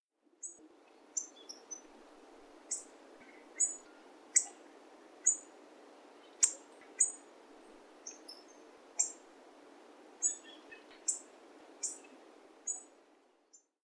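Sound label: black-faced bunting; aoji; 第一調節池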